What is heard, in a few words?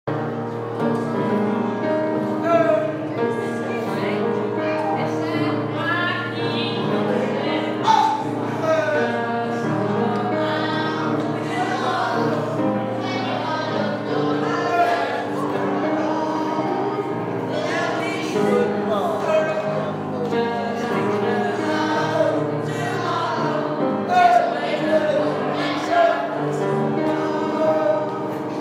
singing and signing assembly